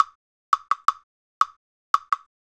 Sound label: Wood Block; Sonic Foundry (Sony Creative Software); Joe Vitale Latin Percussion; Wood Blocks